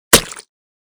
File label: mud splash